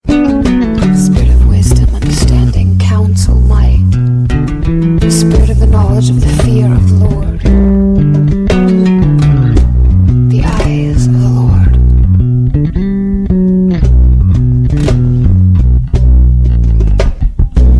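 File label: instrumental ringtone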